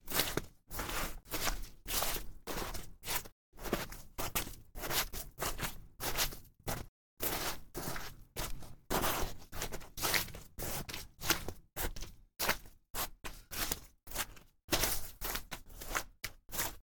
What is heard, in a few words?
walk snow